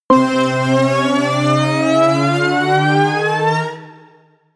charge

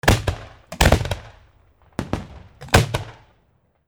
fireworks multiple shorts

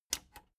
plastic button latch squeak click enable slow

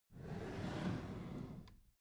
packed drawer smooth clatter slide open

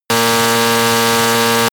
snowmobile engine high speed steady

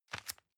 envelope grab soft

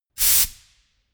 pneumatic pump

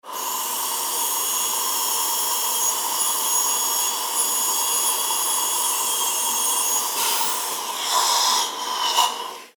aspirator and drill

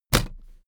suitcase hit empty case

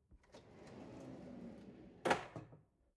drawer narrow close long

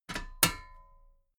metal ladder step regular clank

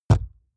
wood impact hollow large dry

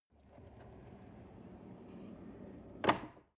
drawer medium close draw

